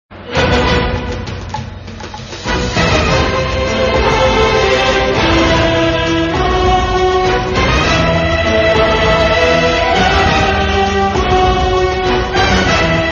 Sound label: god of war ringtone